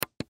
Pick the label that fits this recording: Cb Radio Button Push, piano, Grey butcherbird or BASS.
Cb Radio Button Push